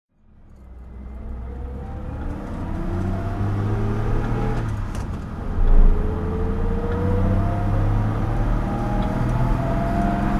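jaguar etype 42 s2 fhc driving, Engine sound driving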